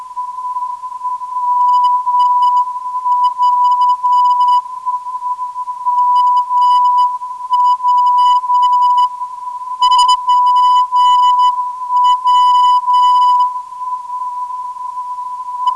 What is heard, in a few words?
447.27kHz